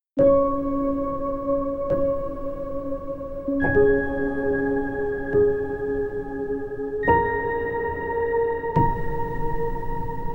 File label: twinkle twinkle little star melody